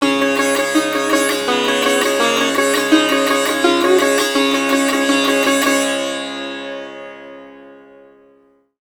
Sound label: SIT, India; Best Service; Ethnic Journey Sound Cube